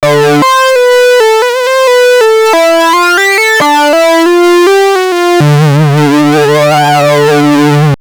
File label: Moog Messenger; 合成器